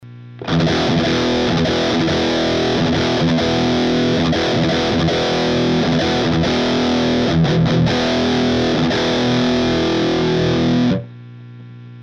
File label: Treadplate Cab